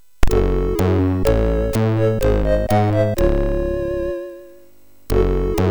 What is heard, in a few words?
Jingles, MOS6581; hvsc